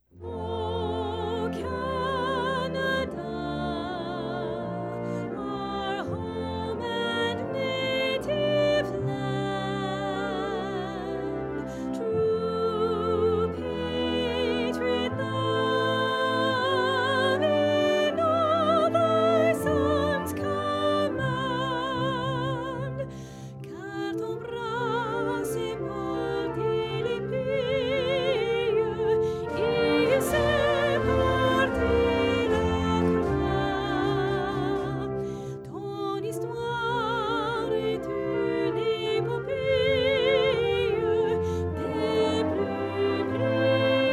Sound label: o canada vocal